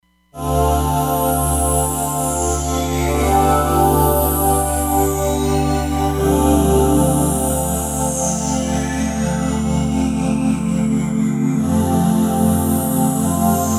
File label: Choral Sweep, Roland Fantom XR